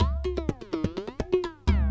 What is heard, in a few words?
PERC LOOP ETHNO 125